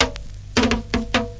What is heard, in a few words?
reggae break, FILLS and ROLLS